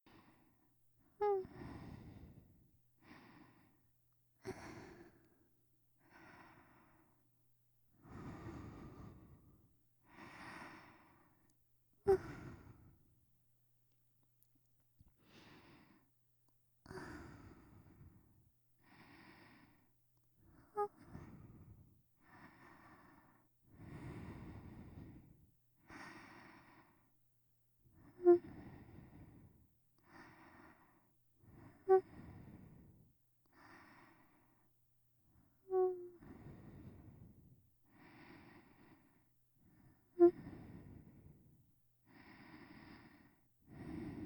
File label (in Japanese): 寝息・寝言入り